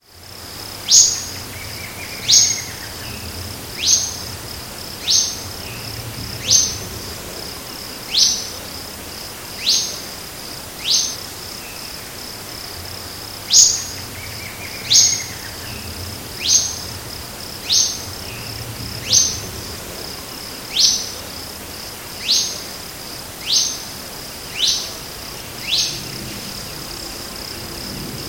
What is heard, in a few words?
Sittasomus griseicapillus; PE Cantareira SP; Silveira